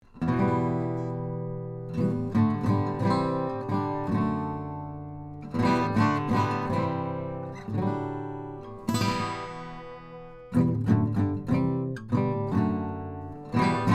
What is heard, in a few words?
Chords